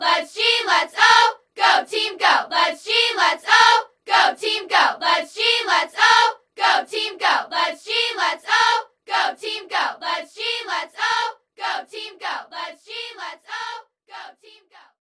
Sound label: Sfx Basketball